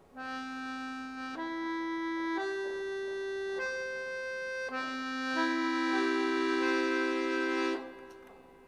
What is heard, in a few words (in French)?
accordeon 1 voix